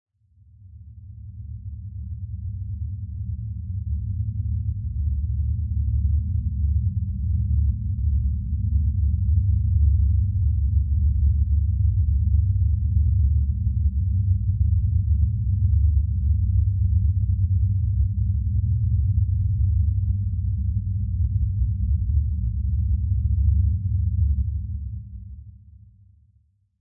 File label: Epic Movie Trailer Drone Ambience Bass Deep Rumble; 史诗电影预告背景音乐